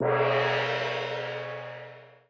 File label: gold rain start; netent